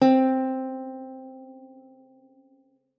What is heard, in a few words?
C4, GUITAR; Xsample VOL-2